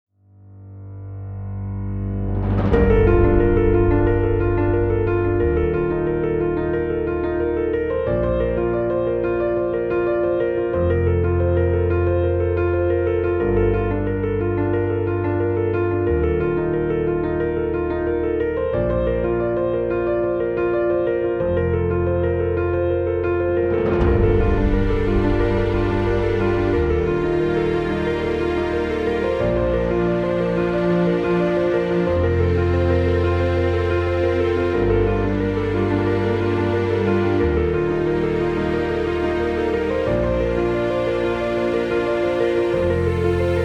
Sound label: Piano